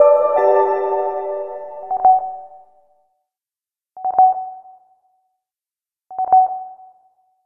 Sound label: battle alert